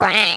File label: Duck; breakout; farm